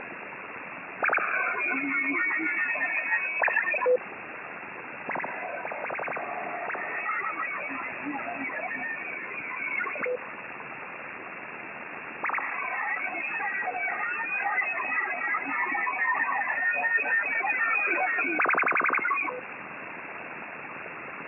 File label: BARRETT ANALOGUE / FFT VOICE SCRAMBLER; (available as an embedded option for most Barrett radios)